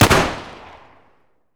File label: 1911 fire; garrysmod